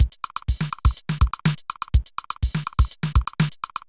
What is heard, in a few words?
Loop, mxr; classic machines